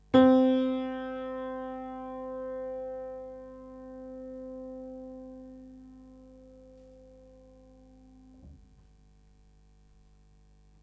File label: piano DO